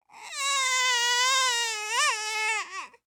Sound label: Human Baby Cry Single Long; Babies (Sound Effects:Voice)